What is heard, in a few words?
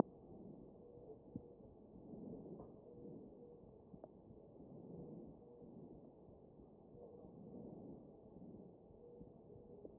chest